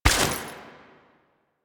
Step Water, Footsteps

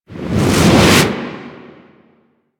Environment Explosion; Proj B; Mission Four; Warframe; The New War

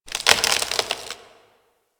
Tree Creak; Hub; Warframe; Tenno

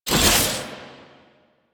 Whoosh, Warfan; Warframe; Tenno; Broken Frame; Quassus Prime

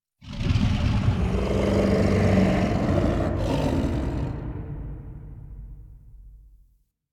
Idle Agile Throwing; Warframe; Voruna; Idles Agile

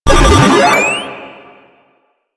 Proto Infested Mutalist Speaker End Scream; Warframe; Abilities

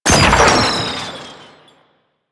Proto Infested Mutalist Proj Fire; Warframe; Abilities

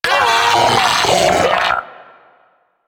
Arachnoid Vocal Death; Enemies; Vocals; Warframe; Corpus; Arachnoid Hunger

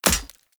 Throwing Knives Impact Armour; Weapon Impacts; Player; Combat

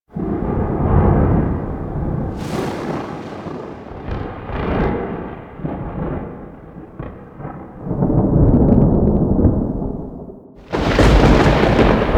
Thunder Amb; Cinematics; Warframe; The New War; Throne Room

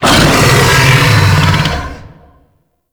Infested Bone Blade Vocal A; Warframe

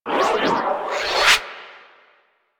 Fire Spear Cast; Warframe; Warframes; Nezha

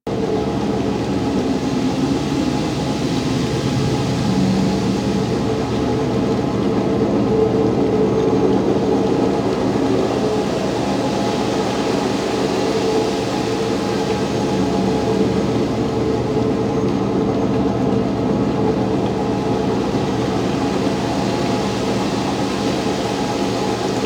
Ocean Interior Amb

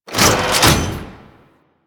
Fortress Traps Turret Door Open; Ambience; Warframe; Grineer Fortress

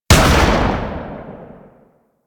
Laser Aim Rifle Alt Shot B, Weapons; Grineer

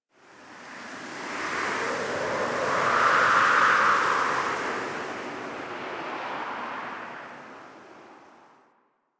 Camp Wind Gust D; Warframe; Quests; TheNewWar; Ambience; Drifter Camp

